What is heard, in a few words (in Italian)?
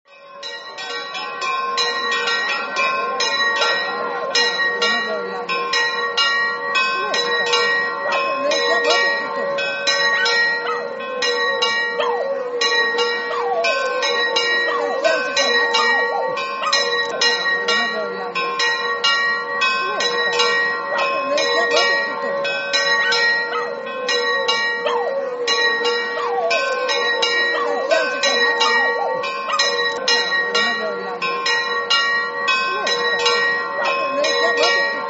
campane; pitassi